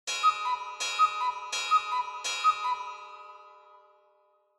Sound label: Cuckoo Clock Bell Reverb Long